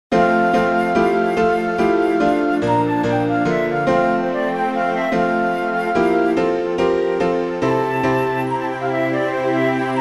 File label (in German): klavier floete